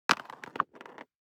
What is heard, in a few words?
DICE on Cardboard, Throw and Roll, Standard, 3 Three Dice; Samples; ArticulatedSounds; DICE Sfx Party (Extended)